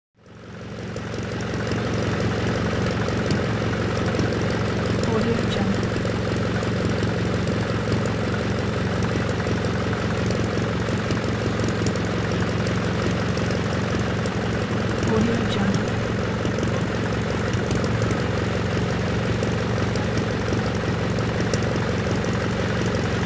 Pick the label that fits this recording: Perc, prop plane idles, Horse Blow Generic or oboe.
prop plane idles